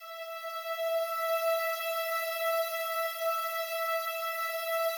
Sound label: slow strings E4, Yamaha VP-1; 90 s Sample CDs; Music Store